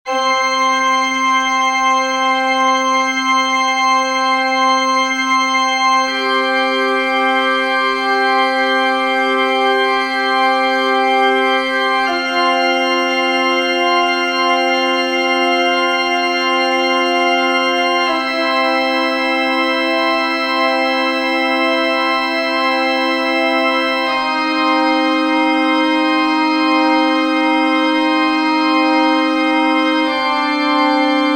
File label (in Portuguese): intervalos; musicologia